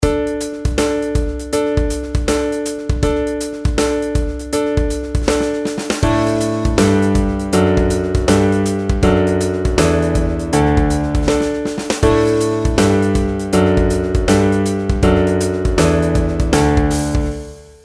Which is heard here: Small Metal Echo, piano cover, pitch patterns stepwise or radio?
pitch patterns stepwise